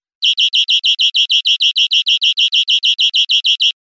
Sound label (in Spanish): chones corridos enrabietados, verderon